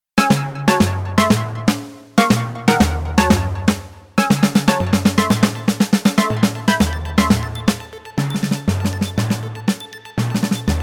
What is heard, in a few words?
etude snare drum synth 120 bpm